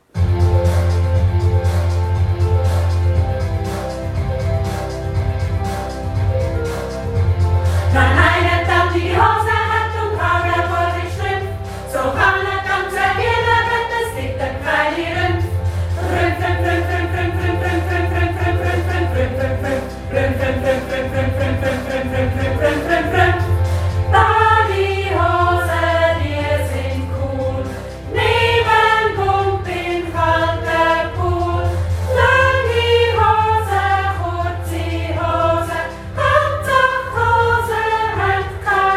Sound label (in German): Hosenrap